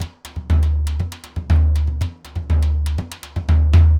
Surdo Samba 120, Drums & Percussion; 120 bpm; Best Service; Festa Latina VOL-1

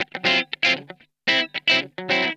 STEAMIN, Funk Guitar; Zero G - Funk Guitar

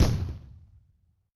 Gun; Yamaha DTXpress-II

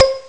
bass marimba; Amiga Soundtracker sample

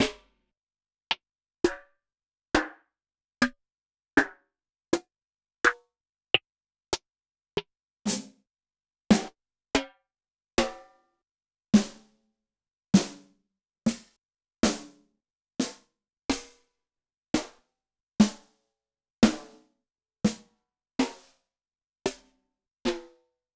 Yamaha DTXpress-II Snare

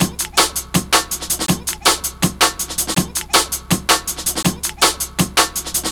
162, breaks; M8

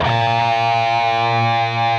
PRS PINCH, Guitars; Spectrasonics; Hans Zimmer Guitars VOL-1

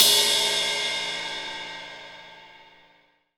18 OLD, 18INCH OLD ZIL RIDE

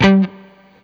BLU PICK, Guitar & Bass; 90 s Sample CDs; Quantum Leap - Guitar & Bass

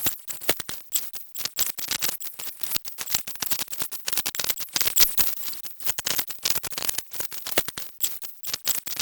mad radio, special FX twisted; USB Soundscan; AKAI

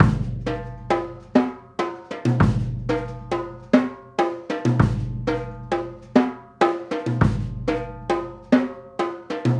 Trap Kit, Drums

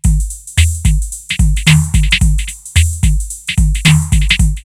808 Nice Hollow 110, drumloops, DrumMachines; X-Static Goldmine

